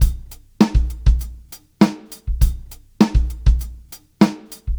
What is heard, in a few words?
Live Beats, R&B Drums